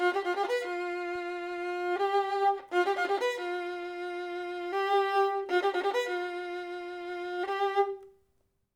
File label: violin